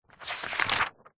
household book wordsearch magazine page turn fast hard; zapsplat